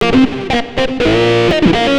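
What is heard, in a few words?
extra lead (egypto funk); Sonic Foundry (Sony Creative Software); Ugly Remnants VOL-2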